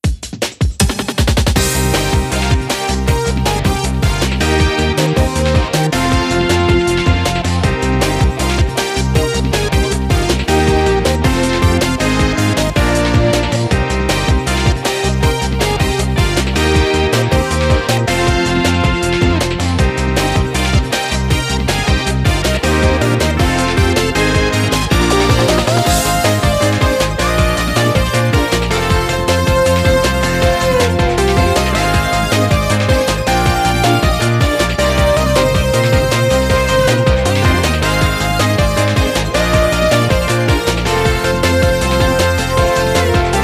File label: Music Game General event